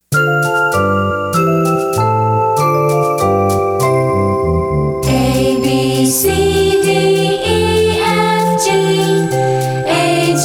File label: Alphabet Song